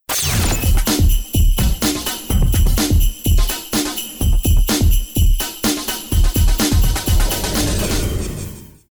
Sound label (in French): Base rythmé